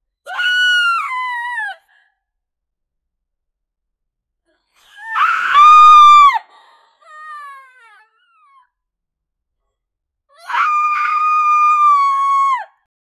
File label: woman scare woman scare, fear